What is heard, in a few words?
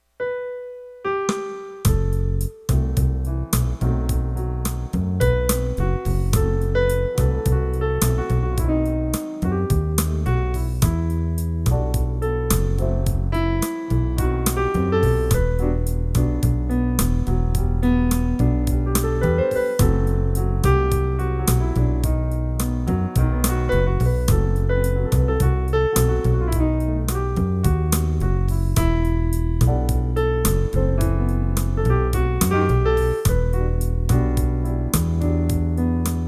Pianist